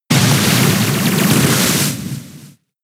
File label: Water Gun; pokemon sfx gen 7 attack moves sumo usum